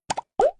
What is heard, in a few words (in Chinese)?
点击; 二十四孝图